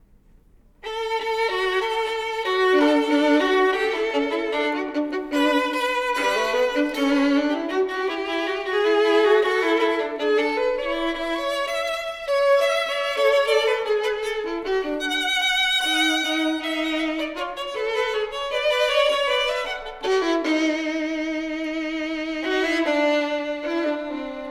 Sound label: Duos for Two Violins, Comic Song